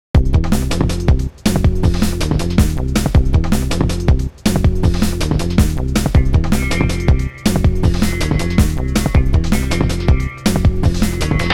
Critical Brass

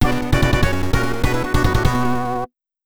GAME OVER (YM2608); New City Hero

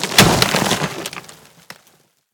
ice smash; Scrat

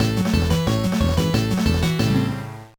Jingle, gba

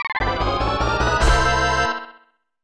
Unknown Jingle; Hakunetsu Pro Yakyuu Ganba League; SNES

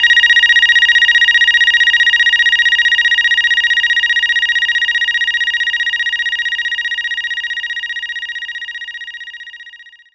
Telephone

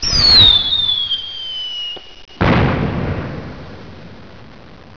Firewrk; Buggy; PS1

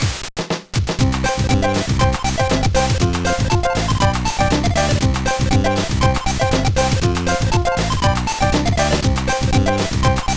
BGM; Shiren Monsters Netsal 2004 GBA

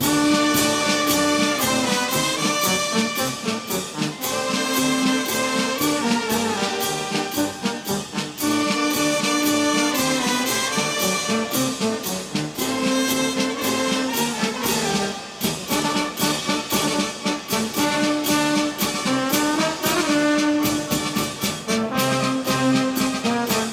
Paso Doble; Torero Arte y Pasion en la Arena

